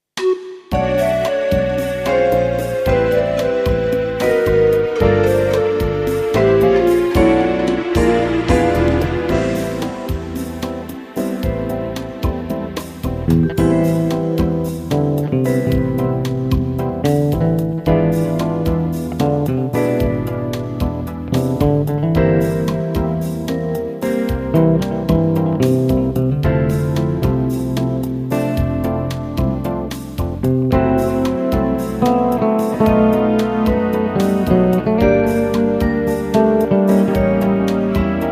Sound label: rain